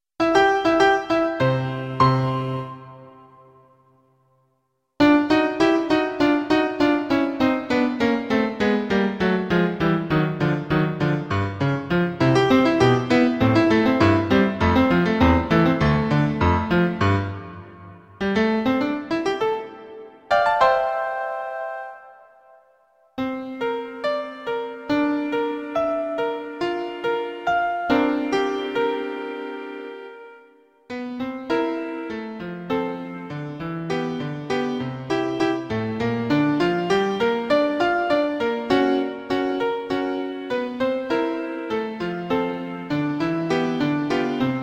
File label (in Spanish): pequeño negro karaoke, flauta; claude debussy; flauta travesera